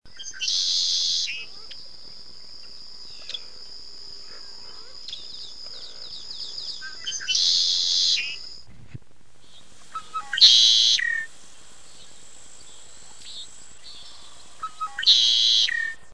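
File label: red-winged blackbird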